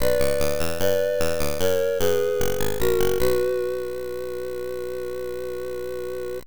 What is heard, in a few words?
vikings in-game; c64